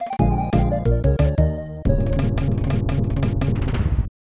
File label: Lose Life; Donkey Kong Country 3; SNES; Mountain